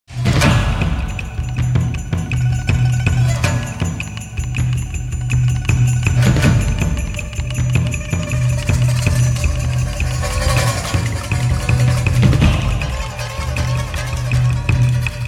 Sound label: Fight; Age of Wushu; Windows